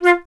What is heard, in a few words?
flute F; Miroslav Vitous - Solo Instruments VOL-2; Solo lnst. II